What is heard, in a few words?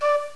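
FLUTE NOTE 3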